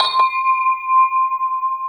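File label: cymbal, Drum & Bass, 140 BPM; Ueberschall; Drum & Bass Resonance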